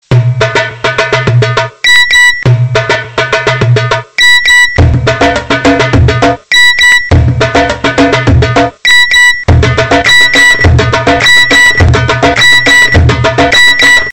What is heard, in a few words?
Egyptian Messagetone